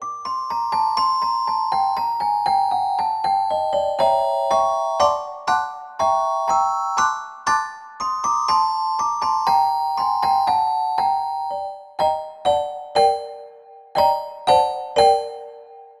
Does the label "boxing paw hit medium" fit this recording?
No